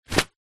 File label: paper sheets handful set down on surface hard; zapsplat; foley